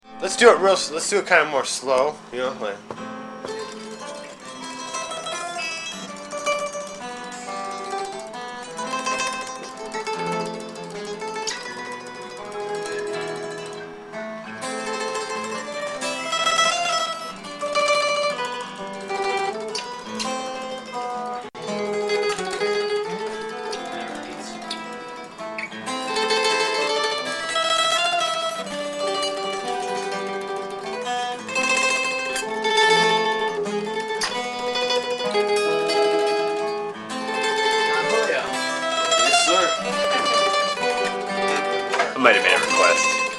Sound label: Acoustic Greensleeves Rehearsal